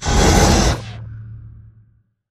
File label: sliding door ext verb close slide; Titanfall 2